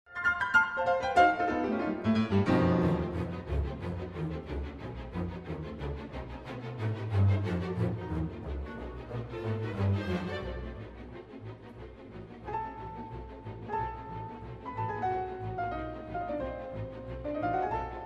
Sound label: Piano Con